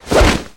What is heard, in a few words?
Pickup Misc Swish; SFX Kit; Pickups